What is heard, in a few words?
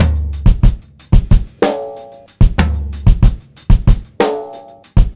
beat, Roots Funk; USB Soundscan vol.41; AKAI